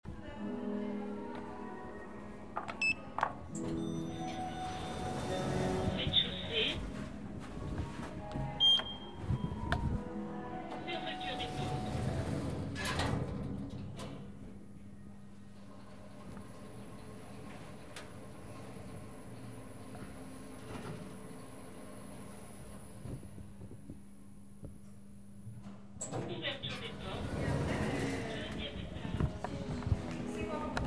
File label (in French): ASCENSEUR REZ DE CHAUSSEE